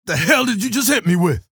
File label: pain ability damage; low health; keel